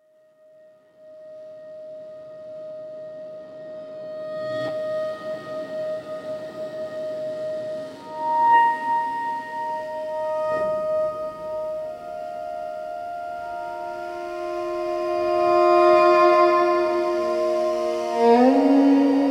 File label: Glass House Echo, spiritual spooky soundscapes